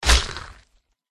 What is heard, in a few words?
attack contact; yasherogriffon